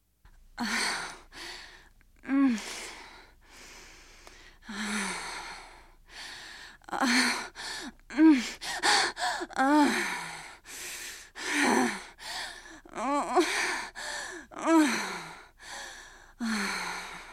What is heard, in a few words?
Female Orgasm Sex Moa